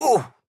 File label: AHurt